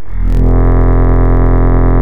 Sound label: Instrument Harmonica; Ableton Live 12 Lite; Vector; Sprites